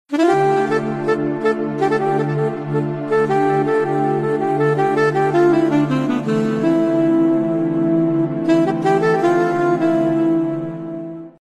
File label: saxophone ringtone; android; ghajini